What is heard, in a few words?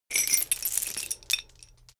metal chain pull up